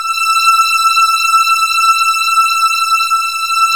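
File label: PAD, synth; AMG - Now CD-ROM (Roland); Old Gold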